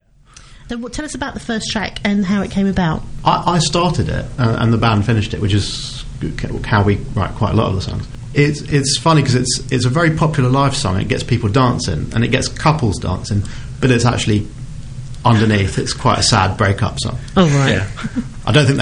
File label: Interview songs